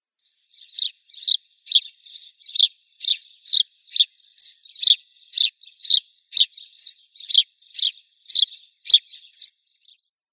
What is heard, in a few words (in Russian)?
vorobei domovii passer domesticus, zvuki prirodi